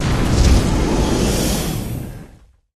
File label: symbol animation bonus; Recode; rampage riches